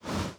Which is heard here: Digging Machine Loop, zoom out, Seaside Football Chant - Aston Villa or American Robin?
zoom out